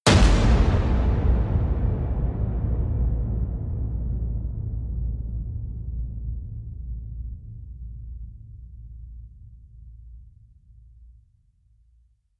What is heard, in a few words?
hit big whack; brand x music